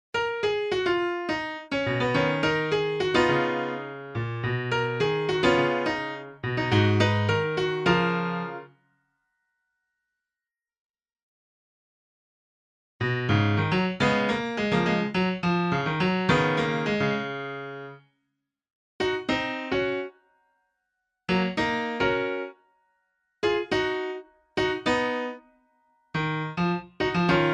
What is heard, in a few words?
piano; premiere pro